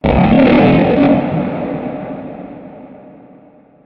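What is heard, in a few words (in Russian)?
крик "чужого"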